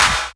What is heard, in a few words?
Clap n Snap, Snares